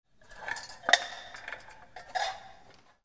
FRICTION

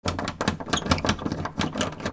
DOORS

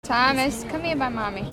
PEOPLE

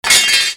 FALLING

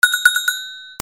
BELLS

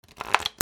CASINO

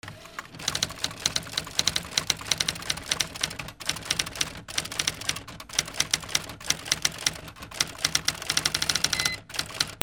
OFFICE

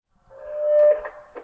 PHONES

